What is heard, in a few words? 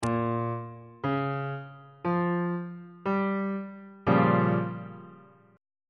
b flat minor 6th chord on bass clef